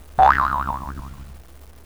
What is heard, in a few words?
BOING